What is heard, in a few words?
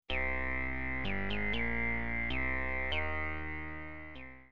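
Miniscope MK2, minimoog tones; Creamware Pulsar 2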